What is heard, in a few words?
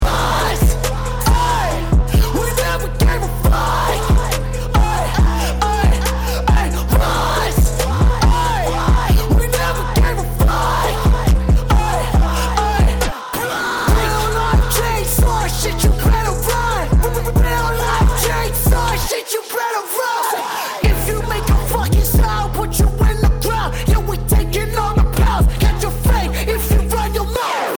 bomb planted